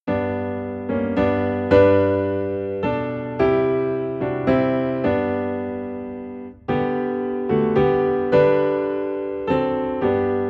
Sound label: Piano in G; Jesus I Come